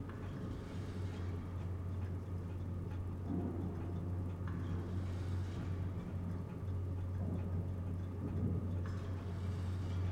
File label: arc amb lab